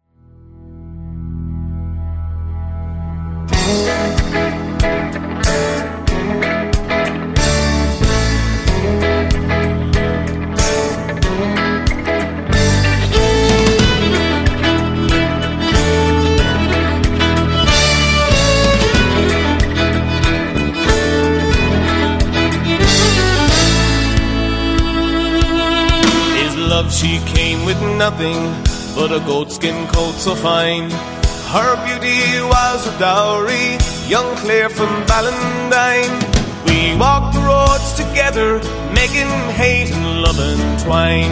Irish Band

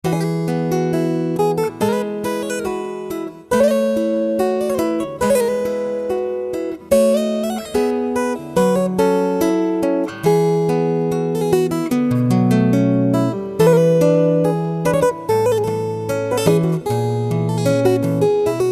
Jazz Guitarist; Ukraine

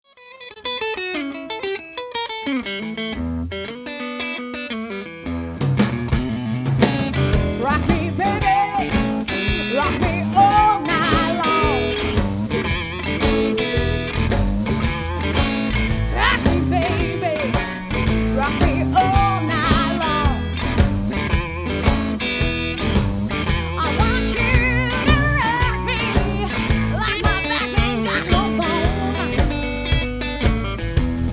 Blues Band; Washington DC

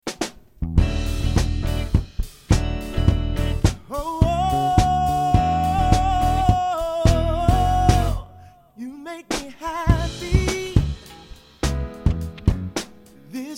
Pop Band; New York Pop Band

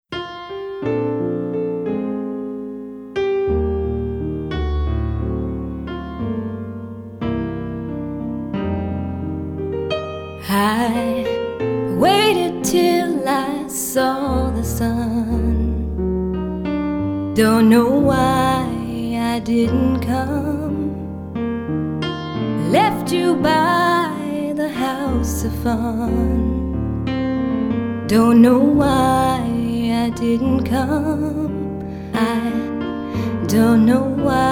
Party Band; Sacramento

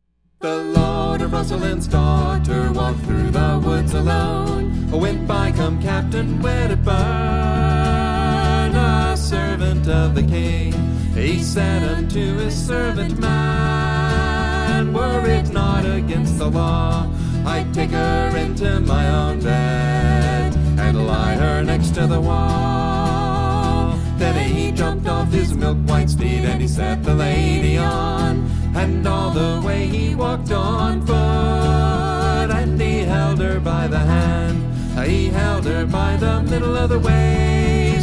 Celtic Band; Houston; Captain Weddburns Courtship

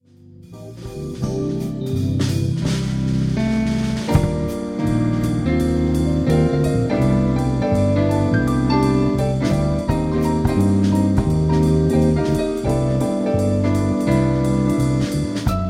Jazz Band

